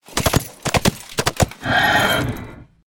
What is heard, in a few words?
Undead Horse Play Underlay